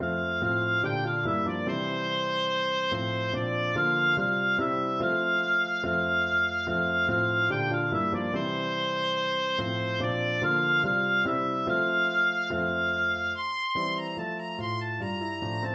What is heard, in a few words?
ding dong, oboe